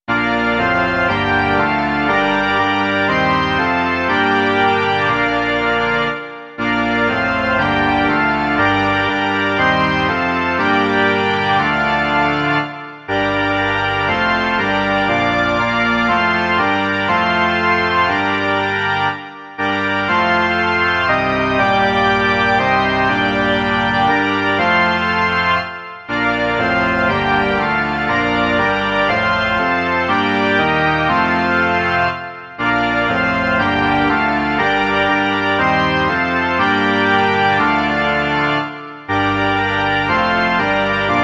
varhany; Pospěš, pospěš

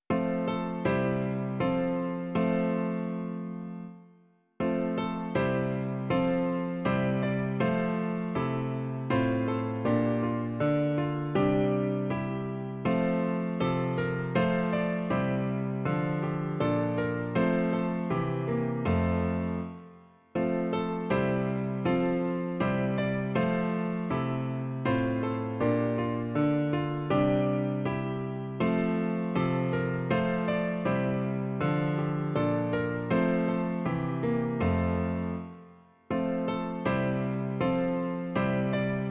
klavír; Klavírní verze

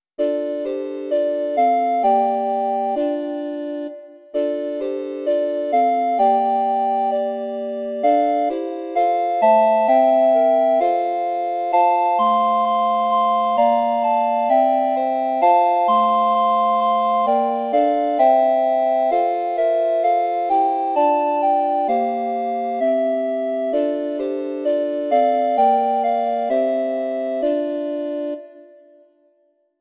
kvarteto zobcových fléten; Ó náš milý Bože; Imaginativní verze

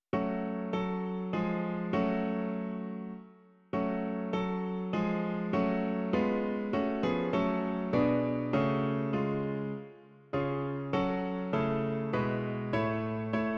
klavír; Beránku tichý; Klavírní verze

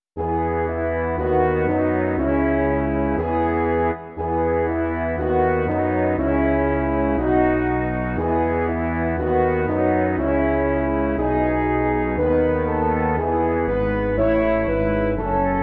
trio lesních rohů a tuba; Imaginativní verze

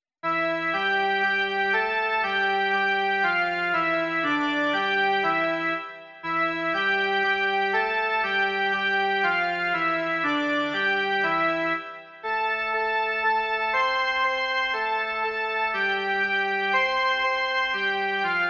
jen melodie

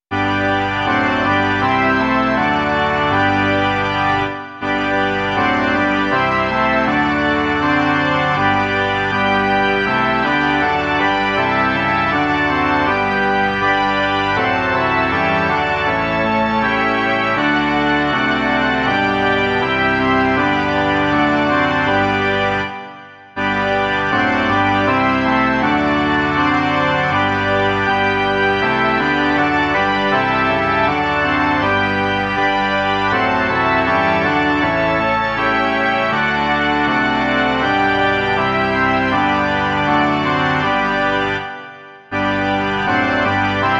varhany; Jest noc

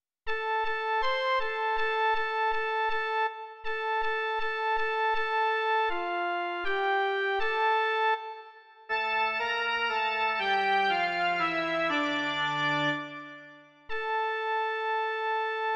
jen melodie, Mužská verze; Doprovody Farského liturgie; S myslí mírnou a pokornou

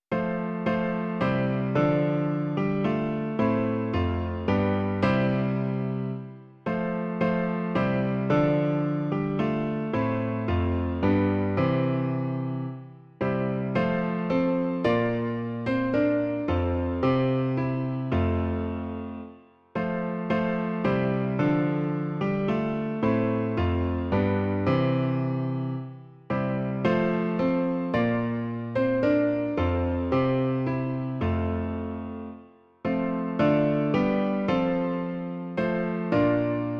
Hospodin sám národů Bůh - klavír; Klavírní verze

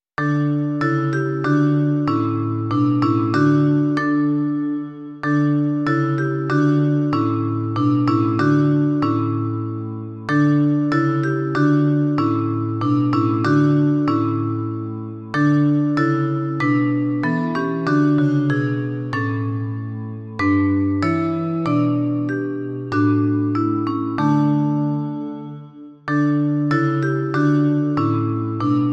vibrafon a synthesizer; Bože, jenž jsi v nebesích; Imaginativní verze

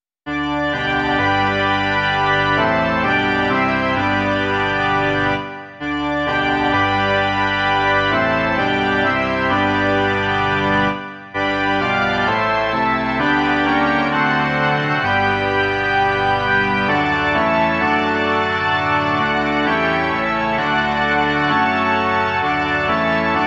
varhany; Až pomine tento svět

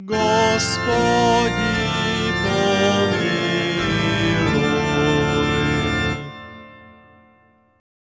Doprovod; První liturgie CČSH; Doprovod a zpěv

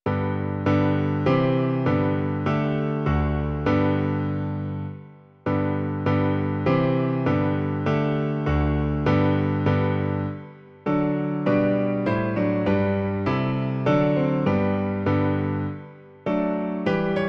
klavír; Noci tmavá, ustup zpátky; Klavírní verze

